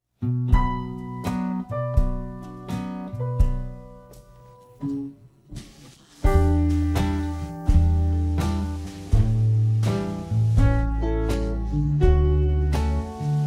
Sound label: no vocals